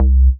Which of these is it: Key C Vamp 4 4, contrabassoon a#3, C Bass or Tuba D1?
C Bass